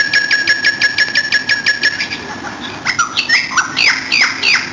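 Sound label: tropical bird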